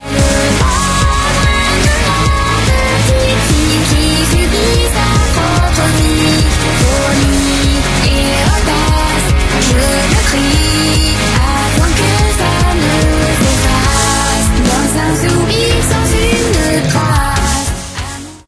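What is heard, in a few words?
background music crossfade; Invaxion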